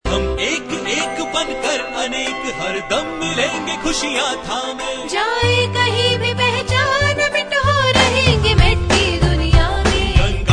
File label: RingTone